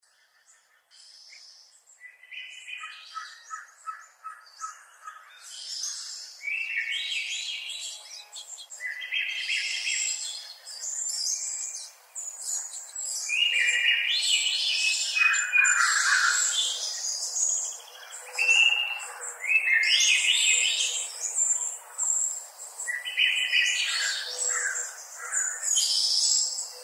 Bird Song

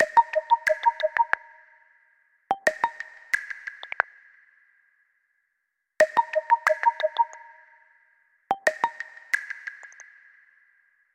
coral

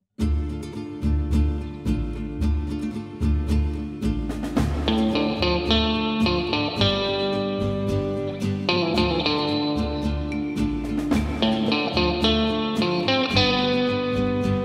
western

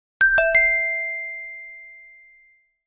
galaxy watch sky; samsung galaxy watch

